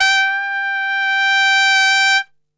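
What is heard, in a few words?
G 3 trumpet; Best Service; Brass Super Section; TRP SWL; 90 s Sample CDs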